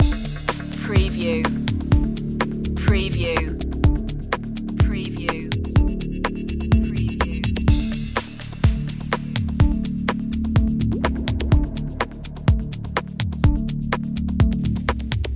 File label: beats loop, chill